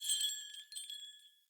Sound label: Foley Arm Bells; Friendly; Jotar